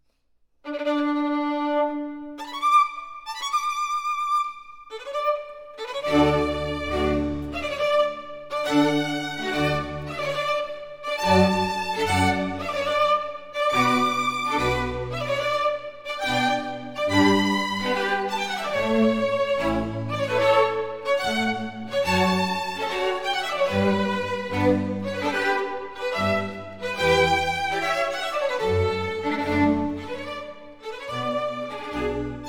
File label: Concerto Grosso In D Larghetto E Staccato; Handel; Concerto Grosso 5; Op. 6 5; HWV 323